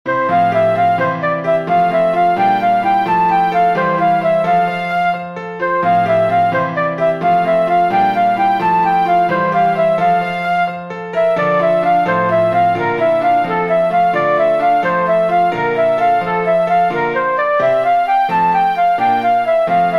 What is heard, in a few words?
gigue